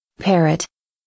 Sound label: parrot, wild birds